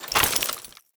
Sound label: Bayonet Melee Whoosh Gear Accent; Warframe; Tenno